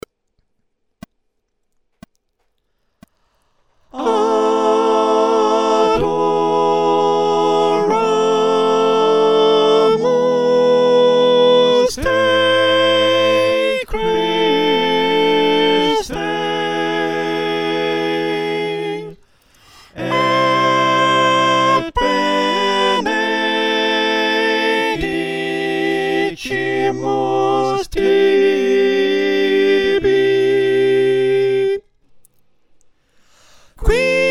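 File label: Soprano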